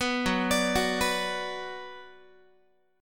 Gb Suspended 4th Sharp 5th, Guitar 4A,4E,5A,5D,5G sharp,6C sharp; arpeggiated